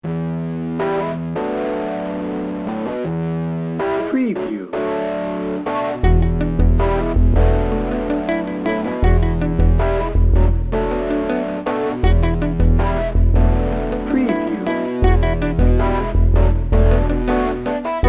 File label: love wins no drums